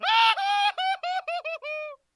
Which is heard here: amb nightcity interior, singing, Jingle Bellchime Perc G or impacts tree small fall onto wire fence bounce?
singing